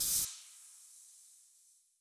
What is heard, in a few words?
mega shipyard electricity amb